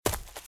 Footsteps - Leaves - Run - Right; RadwinIsland

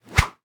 Sword Whip; Weapons; 1001 Sound Effects

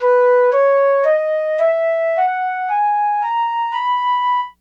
Flute Play Scale Up; SFX Kit; Foley - Misc

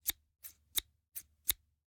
Scissors, snap, snip, shear, med large, triple; Eiravaein Works; Vaeyan IV

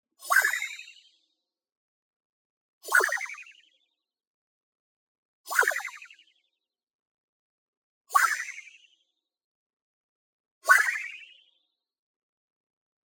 BLING Sword Filter Echo; boom library; Anime Essentials; DSGNMisc

